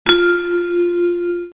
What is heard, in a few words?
chime mid